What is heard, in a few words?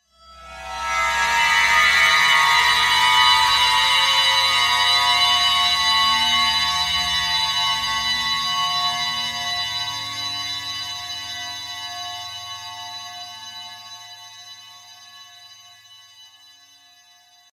Bright Horror Strings Transition; Scary Soundscapes; Crafted Signals